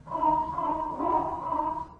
quetzalcoatlus death; paleotest

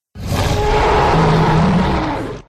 spinosaurus idle; paleotest

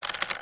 ropes creak; castle

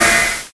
cloud footstep; nyanland

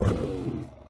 thylacoleo idle; paleotest

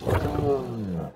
thylacoleo death; paleotest

